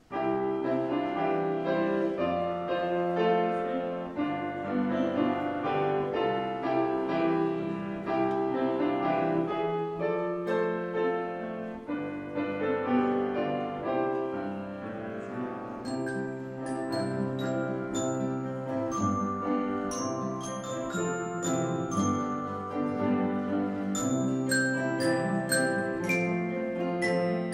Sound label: Instrumental